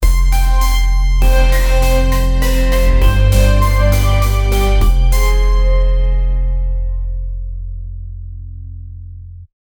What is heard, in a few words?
movie intro trailer music